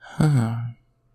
sleepy snore

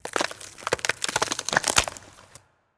Revive; Skell

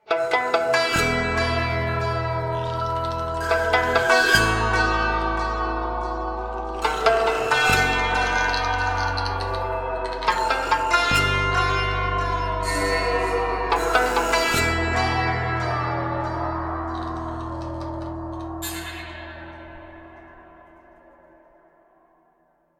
tomb; curious expedition 2 macos ps4 switch windows xbox one xbox series xs gamerip 2021

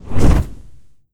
Whoosh Magic Spell

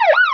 Car Cop siren short

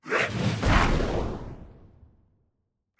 Magus Fireball

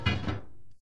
Clank; Dino Donkey Dash; Prod Clank

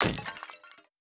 Pot Death

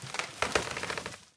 Tree Creak Hit; Folk Hero

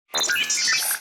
Drone; rabbids multiverse; iOS, macOS, Mobile

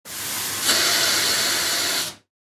Ambience Scatter Machines; Josh Journey Darkness Totems; PS4, Switch, Windows, Xbox One gamerip; Santuario

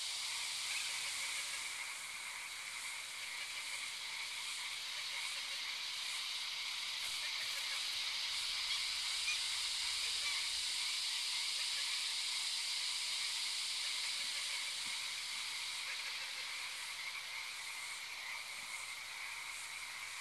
Forest ambience; Frogsong

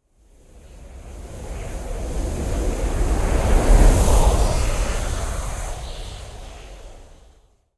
Air Wind

